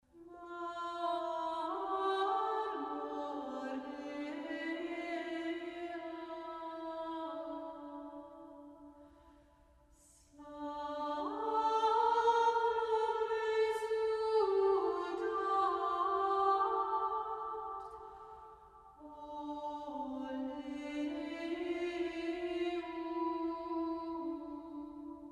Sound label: Chant